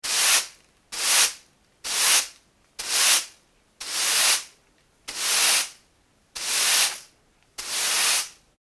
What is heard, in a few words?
household broom straw sweeping; Straw Broom Sweeping On Concrete Floor ( Internal ); Cleaning; McKinney Sound